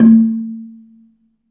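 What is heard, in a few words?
Balafon A2, Asian Traditions; Soundscan vol.60; AKAI; BELAFON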